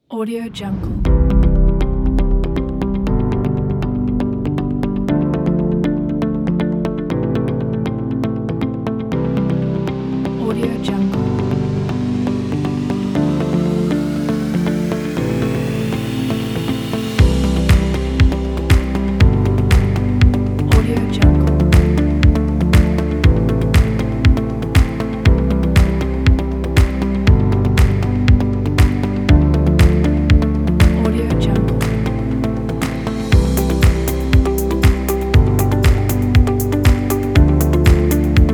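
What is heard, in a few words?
fun technology corporate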